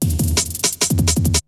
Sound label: BREAKBEATS, TECHNO; Syntec; Wall of Sounds; TECHNO-BOX